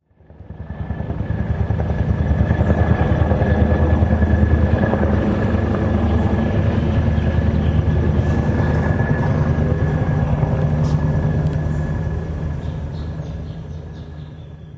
Distant Helicopter with Bird, High quality recording of a Pentagon helicopter flying over; High quality recording of a Pentagon helicopter flying over neighborhoods with birds chirping near the end; Helicopter Sound Effects